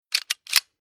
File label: Weapon Pistol Hand Gun Reload Old Austrian Steyr 1914